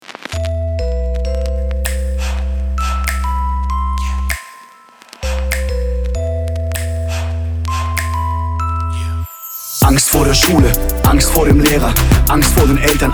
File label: Scratch; Angst